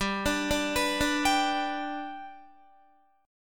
G Major G down Guitar 4F 4A 5C 5G 5C 6E; arpeggiated

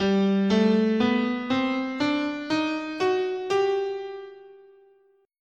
G Harmonic Major, scale

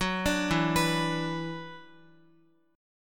E 7th Suspended 2nd, Guitar; E7sus2; arpeggiated

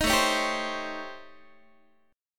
A 7th Sharp 9th Flat 5th, Guitar; A7#9b5; strummed; JGuitar

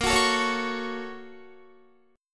A sharp Minor Major 7th Sharp 5th, down, Guitar; 4E,4A,5D,5G,5C,6F; strummed

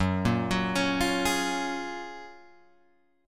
F sharp Major 9th F sharp, Guitar Open G

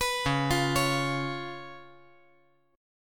C sharp 7th C sharp

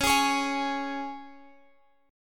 Db 5th Db down Guitar Open F; Db5 strummed; JGuitar

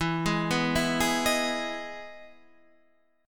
E Major 7th E down; EM7 arpeggiated

